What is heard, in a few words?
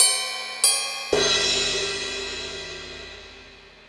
Crash, Drum Machines E-MU Pro-Cussion